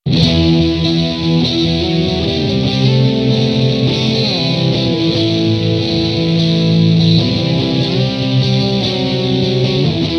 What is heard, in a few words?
Maxon SM9Pro+ effect